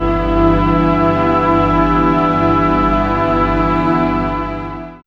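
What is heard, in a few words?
string chord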